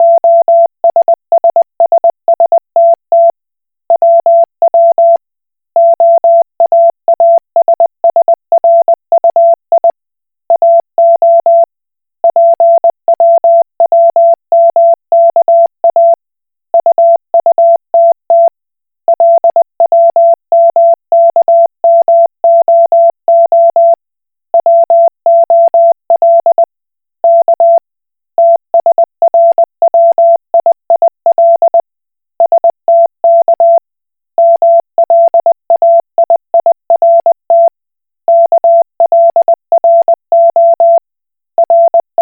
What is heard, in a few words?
Koch Morse